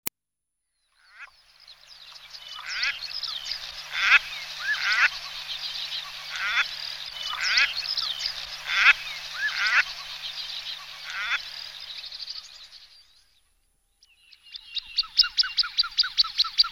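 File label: peganka golos, common shelduck voice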